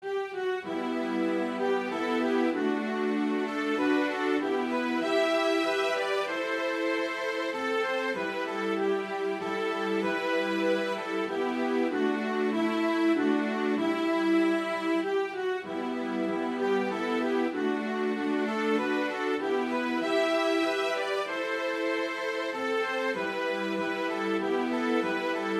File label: The Wistful Waltz, a gentle waltz, violin trios; composed in 1981 as part of a set of three